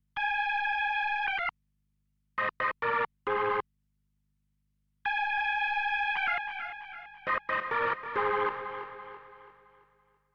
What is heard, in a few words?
Stereo Widening